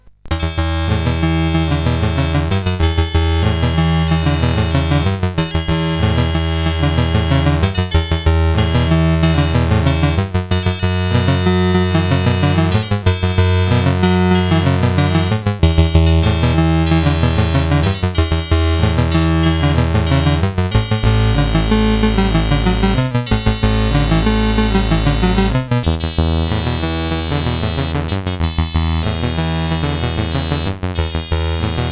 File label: sid CSG8580R5; Magicians Ball